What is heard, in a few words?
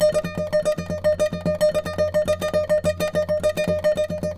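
nylon, guitars, flamenco; Spectrasonics; Hans Zimmer Guitars VOL-2; FLAMENCO FX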